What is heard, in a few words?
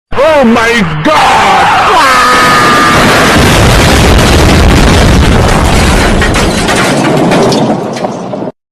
car crash; wario